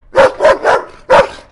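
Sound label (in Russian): короткий злобный лай овчарки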